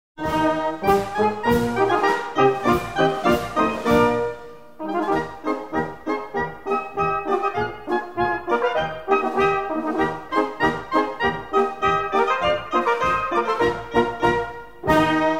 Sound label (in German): Ohne Worte Defilir Marsch